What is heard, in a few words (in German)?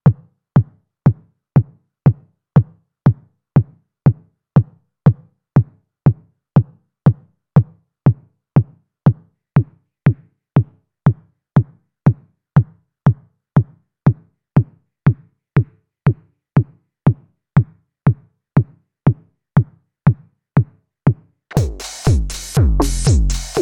Queen Of Pentacles Filter Distortion Steuerung